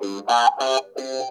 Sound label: NOTE BOX, Funk Guitar; 90 s Sample CDs; Zero G